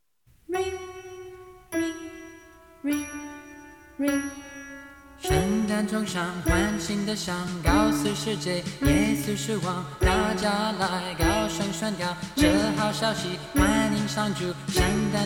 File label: Ring Christmas Bells, Chinese